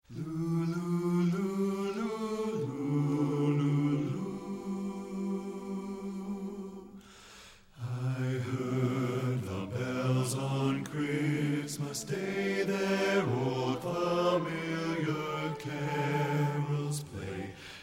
BASS; satb

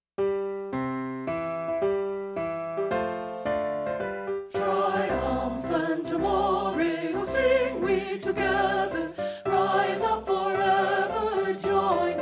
ALTO; satb

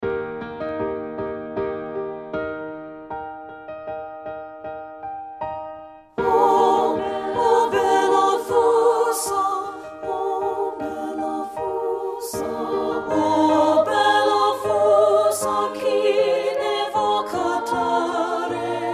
SOP 2, treble; partbypart

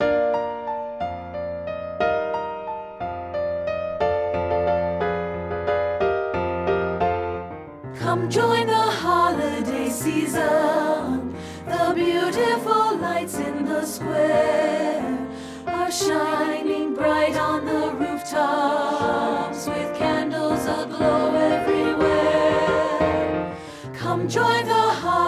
ALTO; satb

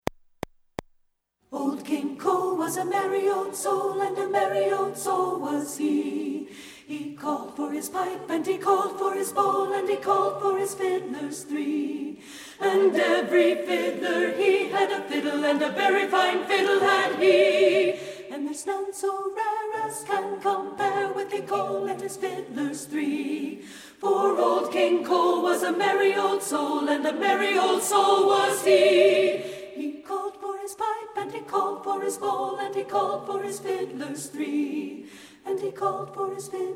ALTO; satb

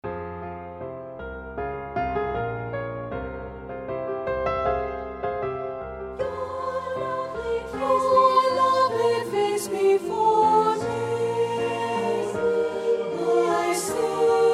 alto

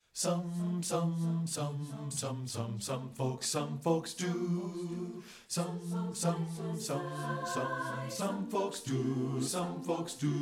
BASS; satb

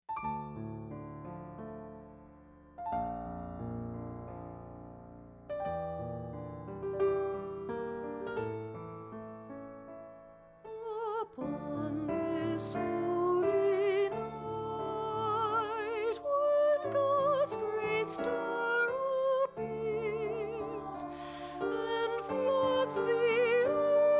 SOP1; treble; CAROL OF THE BIRDS; partbypart

